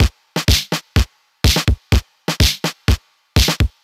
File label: drum loop twosna stripped 125; Classic Chicago House; Sample Magic